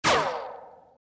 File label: Wrong; Troll Face Quest Unlucky; Android